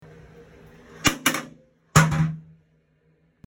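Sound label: sidenie unitaza, toilet seat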